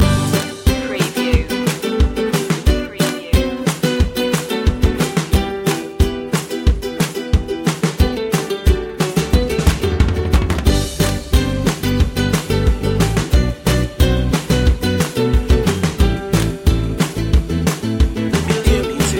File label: life loop